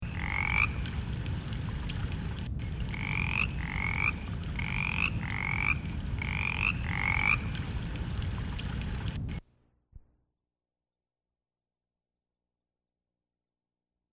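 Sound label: toads Mountain Chorus Frog; wildlife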